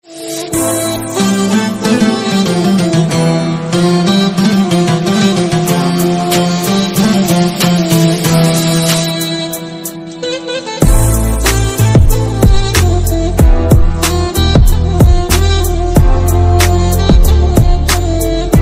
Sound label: Mobile ringtone